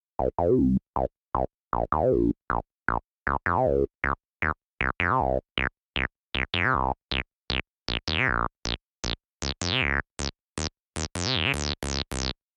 Bassline; chippy

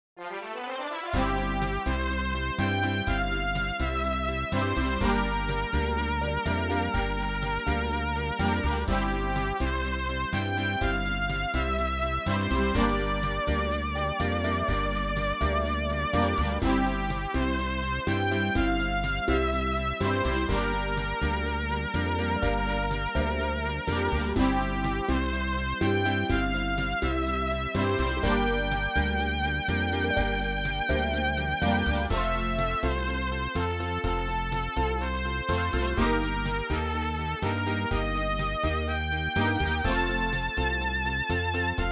MIDI Field

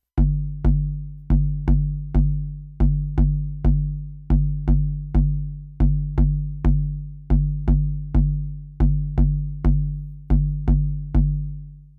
Bassline; chippy

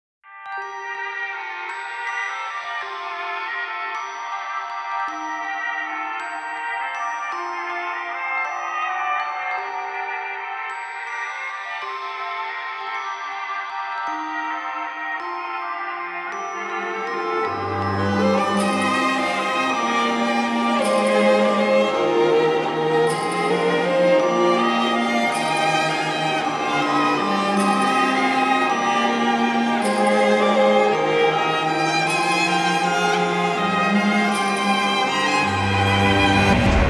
Collapse; mahou shoujo madoka magica hajimari no monogatari eien no monogatari

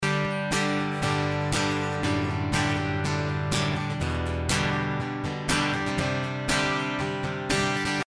guitar; Weed Shop 2; iOS Windows gamerip 2017

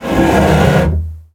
floor slide open; Birthday Party Bash; Wii; fashion room sfx

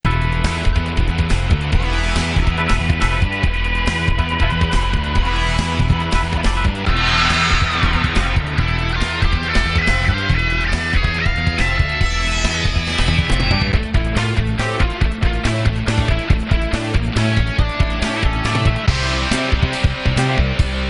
Speed Loop; cruis n exotica

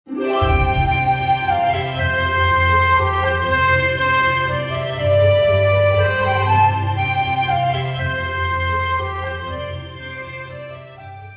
Celebration Loop; runner heroes the curse of night and day

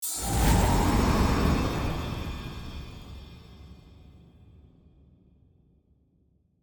scroll levelup; Tower of Time; PS4

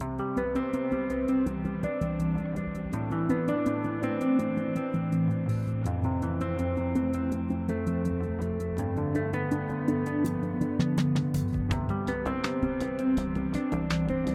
Mood Normal Light

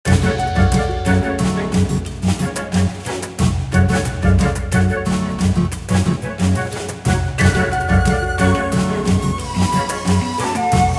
Battle; SuperMagical; iOS PS Vita Windows

